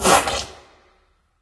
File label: shoot, antlion guard far; hl2